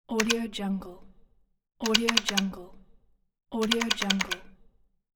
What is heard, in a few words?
telephone button pressing